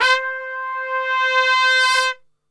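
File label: C 3 trumpet; Best Service; Brass Super Section; TRP SWL; 90 s Sample CDs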